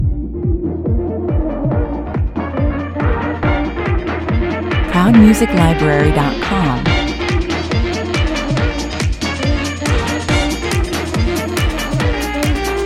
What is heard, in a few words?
shuffle stinger